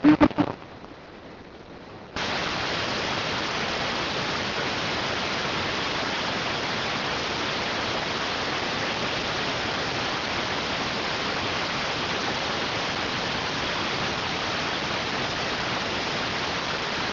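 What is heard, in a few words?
radioteletype receive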